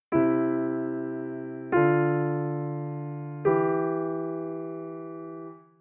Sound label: I ii I6 soprano bass